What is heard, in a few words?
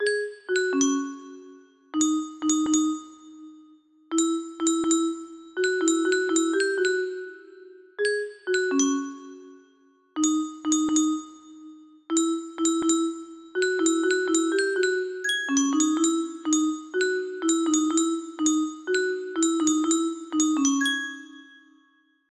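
music box melody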